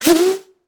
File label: Bullet Pass By; Sound Ideas; Guns